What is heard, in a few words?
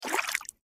tap